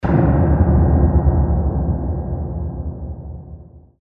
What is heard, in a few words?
piano explosion